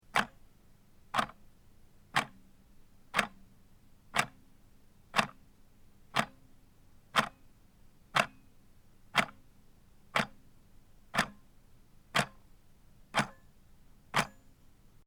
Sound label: Old Clock Ticking, Old wall clock; grandfather clock ticking seconds, for 15 seconds